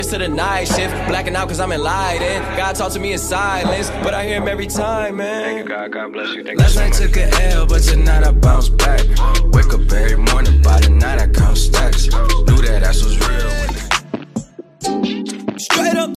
hip hop ringtone

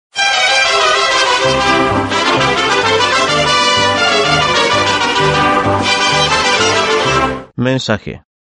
mexican mensaje

